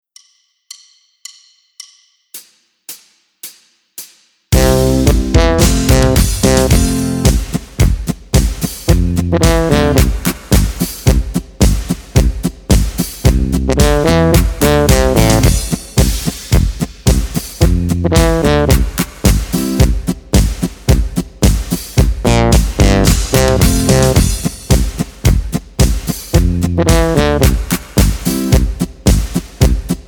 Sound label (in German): G C F B ohne Begleitung